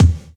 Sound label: short kick drum single hit e key; Duration 0:01; Channels Mono; kick drum samples